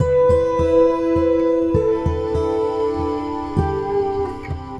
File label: Guitar And Synth